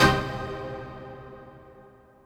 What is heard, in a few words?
Dance Mega Synth; Best Service; 90 s Sample CDs